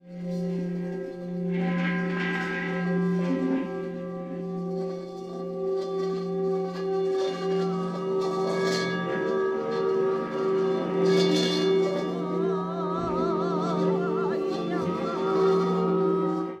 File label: bowls korea